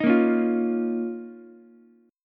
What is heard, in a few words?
D sharp 7th Suspended 2nd D sharp, Ukulele; strummed; 8,10,9,8; D#7sus2; JGuitar